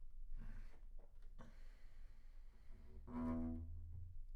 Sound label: Bass Room